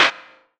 small reverb snare single hit d sharp key; snare drum samples

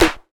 phat snare single hit e key

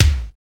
kick d key

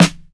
boom snare one shot e key

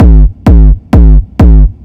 Techno Kick Sub SPatial, 129 bpm

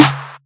steel snare drum sound c sharp key; snare drum samples

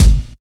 clicky bass drum sample g sharp key

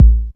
clean kick A key; kick drum samples; drum single hits one shots

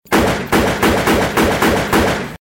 repetitive gunshots

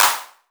hand clap sample b key; single hits one shots

